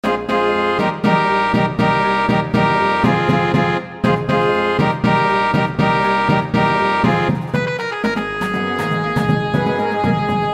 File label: perc